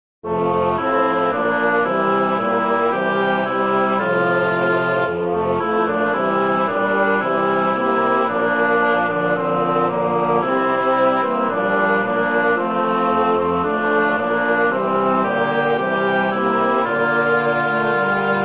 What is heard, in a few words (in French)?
Extrait sonore généré sur MuseScore avec des timbres de bois; Durée : env 2min30; Un clocher dans la brume